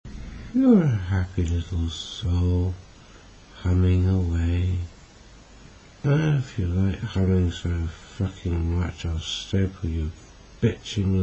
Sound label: happy Humming